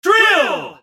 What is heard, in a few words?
Drill